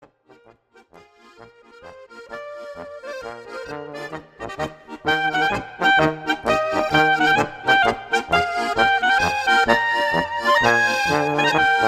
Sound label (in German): Polka